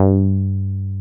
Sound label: 303 BASIC G3; Drums In Your FACE!